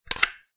Shotgun Insert Round; Project Zomboid

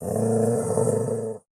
Wolf Growl; Zomboid 41.78.16; Project Zomboid

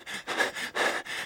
male out of breath; Zomboid 41.78.16; Project Zomboid

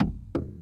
vehicle key; Zomboid 41.78.16; Project Zomboid

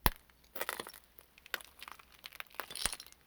Walk On Broken Glass; Zomboid 41.78.16; Project Zomboid

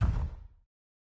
thump; Zomboid 41.78.16; Project Zomboid

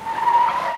vehicle skid; Zomboid 41.78.16; Project Zomboid

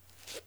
zombie footstep; Zomboid 41.78.16; Project Zomboid

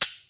stormy Revolver Click; Zomboid 41.78.16; Project Zomboid

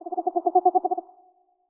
Owl; Zomboid 41.78.16; Project Zomboid

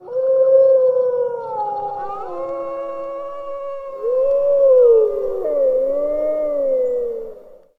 Wolf Howl; Zomboid 41.78.16; Project Zomboid

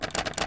door locked; Zomboid 41.78.16; Project Zomboid

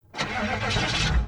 vehicle start; Zomboid 41.78.16; Project Zomboid

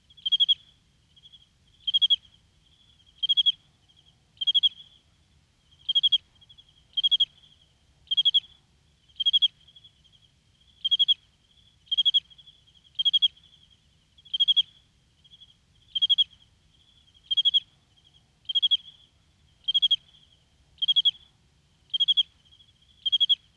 Cricket Single Close Reverb; Zomboid 41.78.16; Project Zomboid

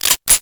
9mm Rack; Project Zomboid

